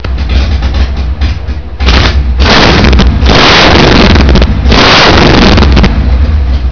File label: harley rev engine; Motorola MPX200